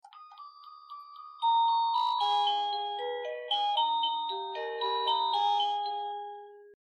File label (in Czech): melodie